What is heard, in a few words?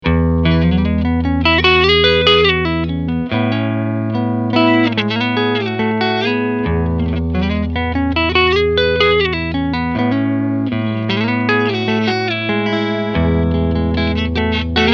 Fender Tone Master Twin Reverb Blonde Clean Normal Channel EQ Full